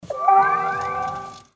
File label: System Start